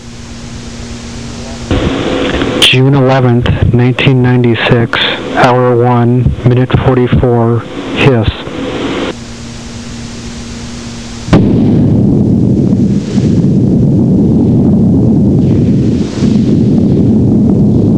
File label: hiss